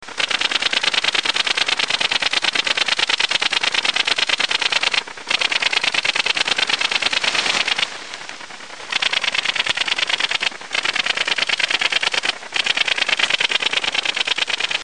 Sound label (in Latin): tettigonia viridissima; wirbellos; insekt; heuschrecken